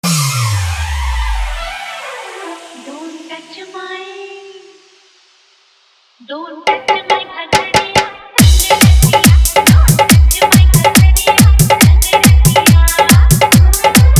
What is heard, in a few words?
Old is Gold Hard Dhollki Bass Dance Mix